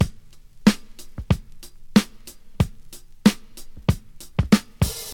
94 bpm drum loop d key, Free drum groove - kick tuned to the D note. Loudest frequency: 1037Hz